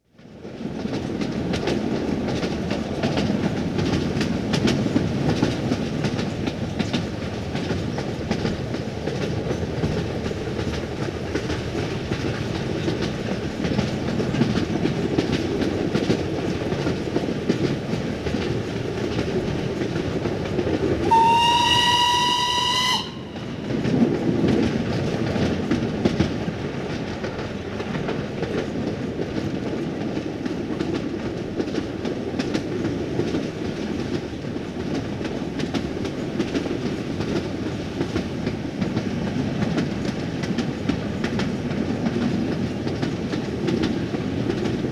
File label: Steam Train Cars Over Tracks; Period Vehicles